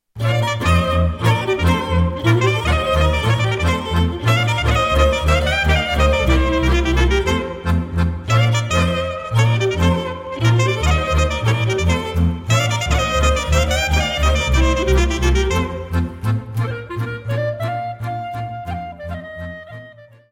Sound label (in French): Briul banatean - Roumanie